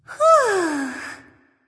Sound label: sigh; smite